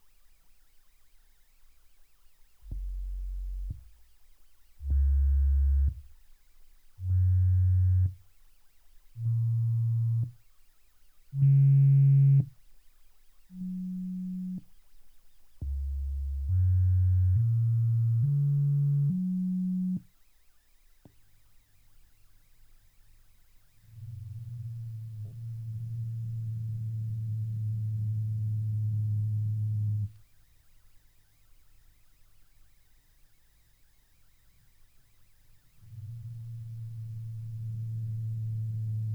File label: Stimulation program diff freq playback